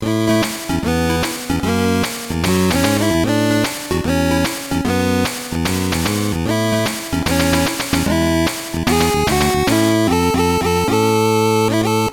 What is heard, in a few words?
Unused Song; Mogura de Pon